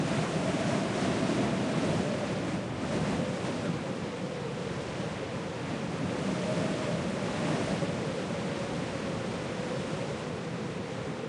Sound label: high wind